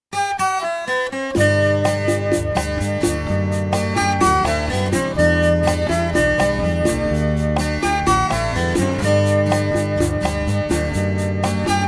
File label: Commercial; Film Classical